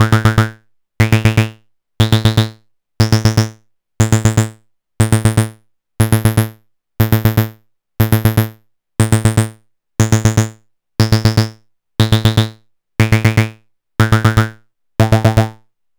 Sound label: Techno Synth; Sonic Foundry (Sony Creative Software); Trance NRG VOL-2